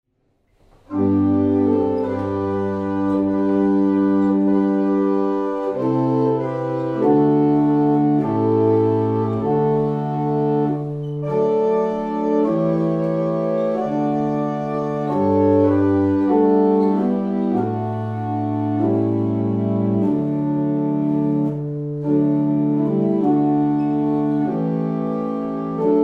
Morning Hymn, barrel organs; Steeple

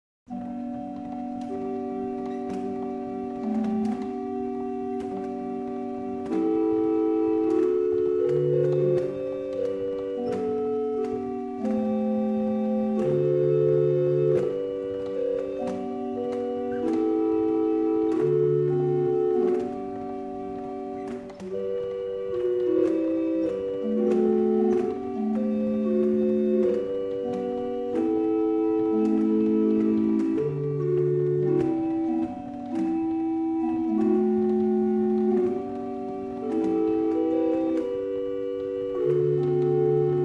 Evening Hymn, barrel organ; Liddington

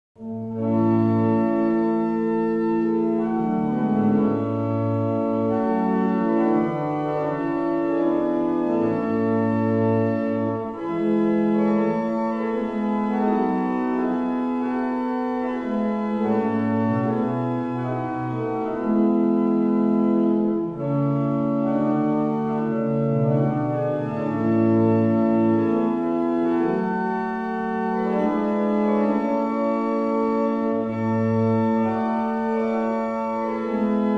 barrel organs; Avington; Wareham

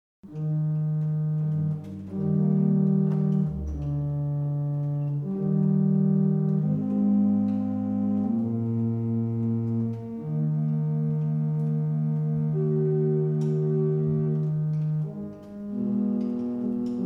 Damaged, barrel organs; Witton

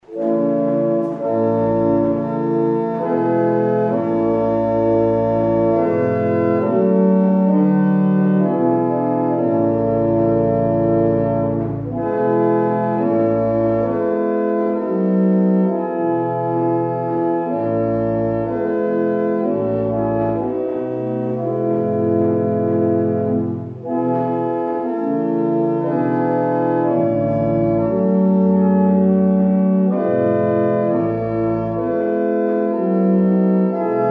barrel organs; Barnston; Hanover

